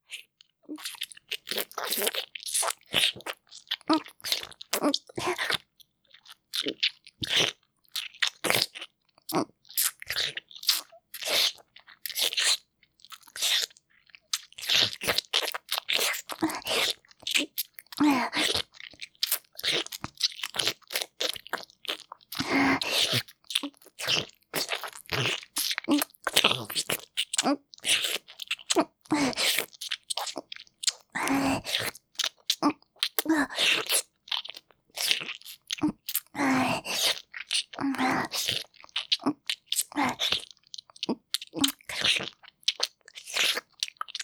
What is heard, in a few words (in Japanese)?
フェラ 激しめ